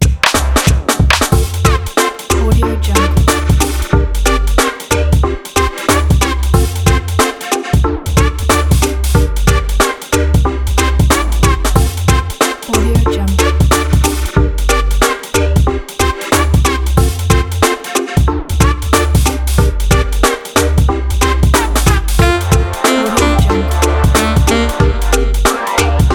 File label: summer happy hop